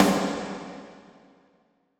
snare drum fett klingen lassen reverb

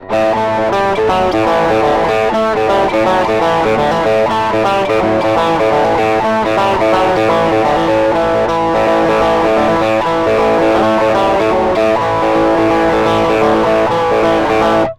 dreiviertel drive; fame tube steamer

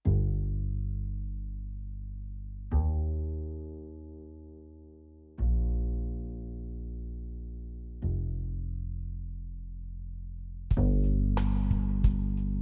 Akustik-Ballade; songwriting

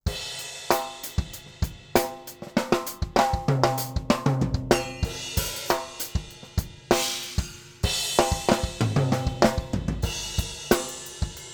img stageline drumset groove mit fill-ins

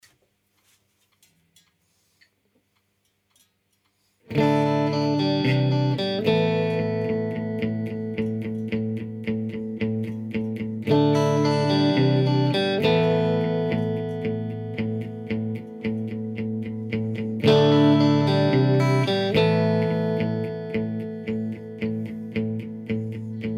eventide tverb gitarre send

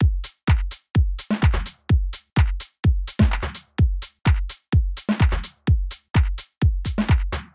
filtern

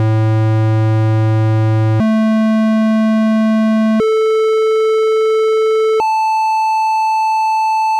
oszillator rechteck

